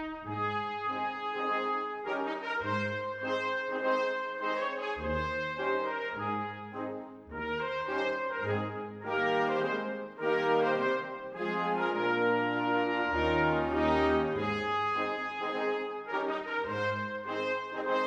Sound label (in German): Aida Trompeten